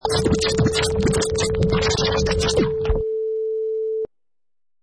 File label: Vinyl Fast Scratch 100 bpm